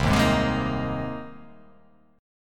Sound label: C Minor 7th Sharp 5th; down; chord shape